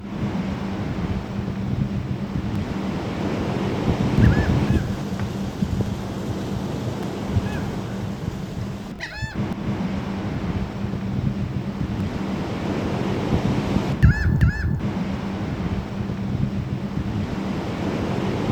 waves gulls

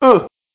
grunt male

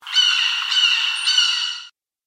monster frozen hawk

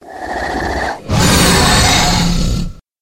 monster wyvern

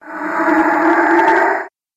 monster death

